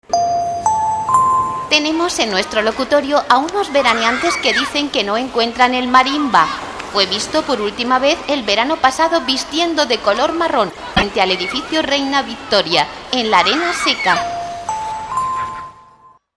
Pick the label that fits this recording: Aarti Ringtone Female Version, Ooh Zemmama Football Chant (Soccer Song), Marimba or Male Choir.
Marimba